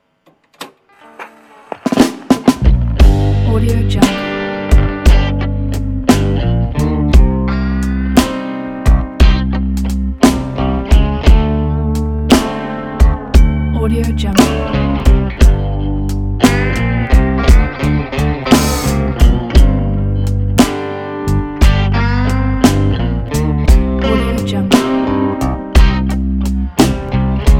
the blues

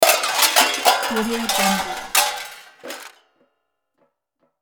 garbage spill impact; hollywoodedge

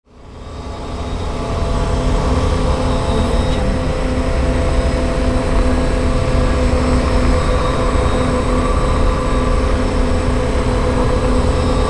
space vehicle hover idle constant space vehicle; prosoundfx

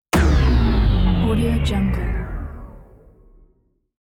power down; sfxroom

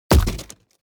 impact body fall wood dull crunch

